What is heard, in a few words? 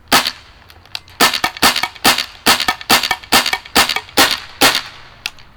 clipped trracer long halved, guns